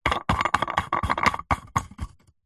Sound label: household lightbulb screw in plastic lamp; zapsplat